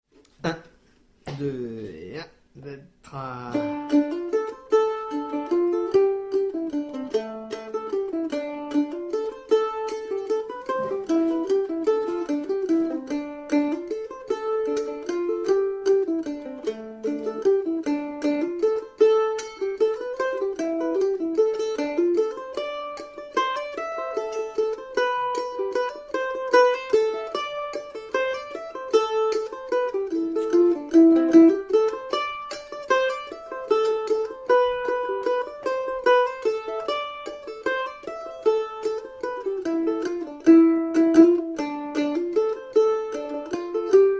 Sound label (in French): petite valse